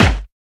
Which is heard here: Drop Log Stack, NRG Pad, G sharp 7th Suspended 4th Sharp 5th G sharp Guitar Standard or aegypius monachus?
Drop Log Stack